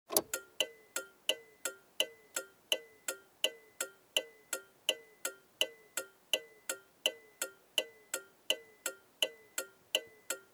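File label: Car turn signal switching on and off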